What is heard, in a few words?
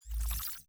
digital whoosh